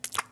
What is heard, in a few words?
Panel Burger Patty Grab; Among Us